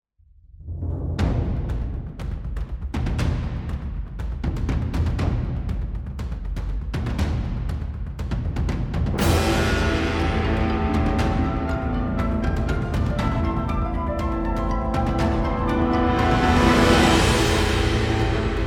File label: Field BGM; Xenoblade Chronicles 3